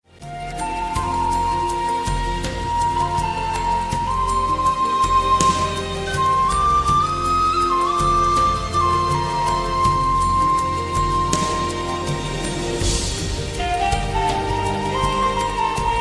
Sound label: samsung nature